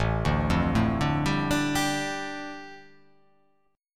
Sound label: A 7th Suspended 4th Sharp 5th, A, down